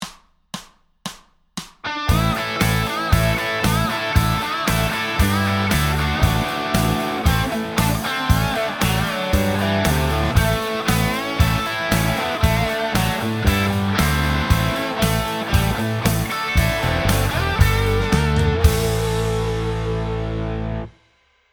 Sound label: solo